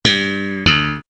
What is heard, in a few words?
sitcom transition bass riff; seinfeld